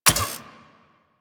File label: Railgun Fully Charged